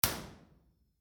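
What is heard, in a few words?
mine site 2 way mono processed, impulse response, Gill Heads mine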